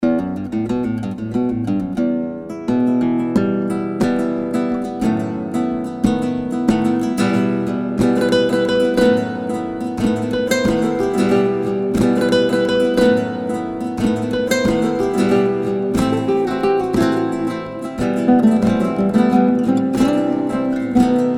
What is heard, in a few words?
Acoustic Latin Guitars